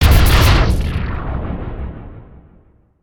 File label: Spider Boss Ground Slam; creatures mechanical